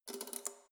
letterbox metal key pull out soft

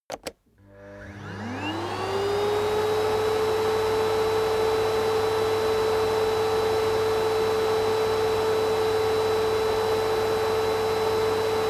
vacuum

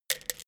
pills drop into box

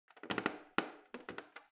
plastic button creak defect press

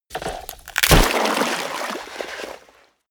frozen surface break

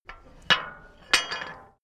metal gate bolt

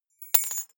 key ring small drop parquet soft

